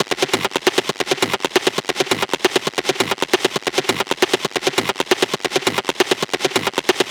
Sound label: Perc Loop 135, Techno